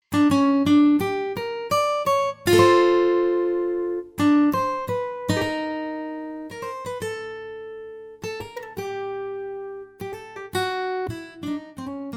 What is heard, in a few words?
G minor violin